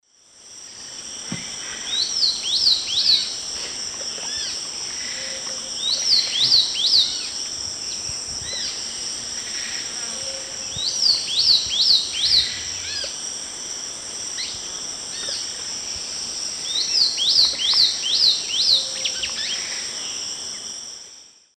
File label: Myrmotherula sunensis, song and calls; S. America; Eastern Lowlands